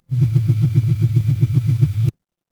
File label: office phone vibrate